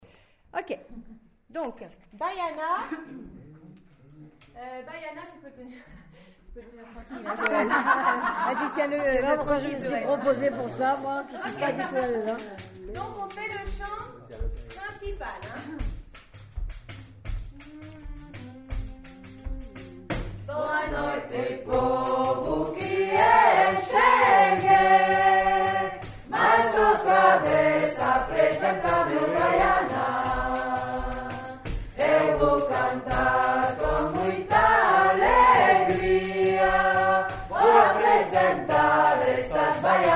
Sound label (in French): Baiana chant